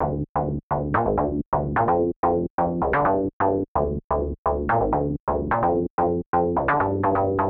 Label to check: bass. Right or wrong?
Right